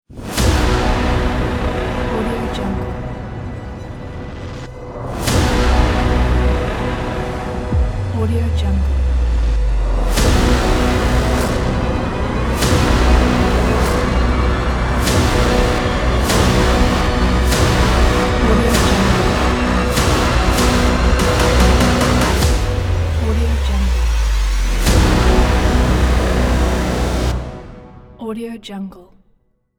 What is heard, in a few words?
massive hybrid hollywood logo